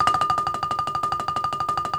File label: BALAFON, African Melodic